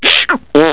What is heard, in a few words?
raspberry